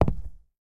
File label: Footsteps Concrete Shoes; FX Oneshot